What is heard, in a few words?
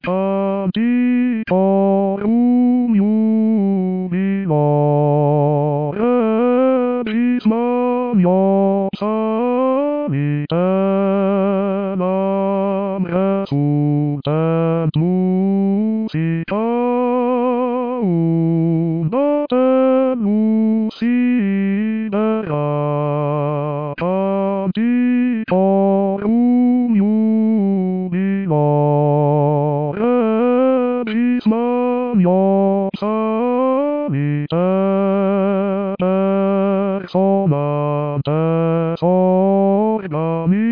Basse; Canticorum Jubilo; Haendel